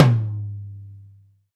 TOM, Roland; Club-50 - Foundations Roland; Ext.Snare 2

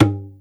tabla, Yamaha Motif ES drum machine; 300 Drum Machines; Yamaha Motif ES-Series

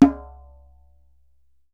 DJEMBE, African Perc

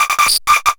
MORSE160, Hard & Loud Techno; USB Soundscan vol.01; AKAI

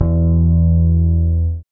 Basses, Roland

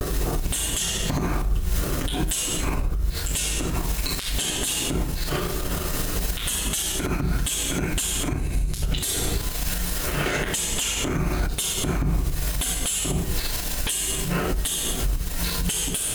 MISS MOUTH HAT LO 71 BPM; BALLISTIC

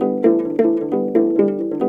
HRP AF HRP, Afro Harp Phr; Roland LCDP11; Africa VOL-1; Afro Harps

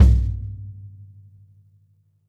kick - snares on; JazzFunkKit

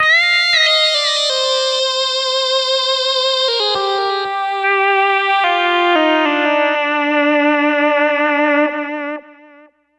80s Lead; Dr. Fink's Funk Factory

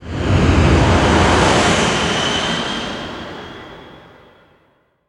NOISE, Production Effects

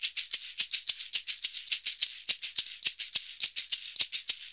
SHK, Shaker; Univers Sons; Basicussions

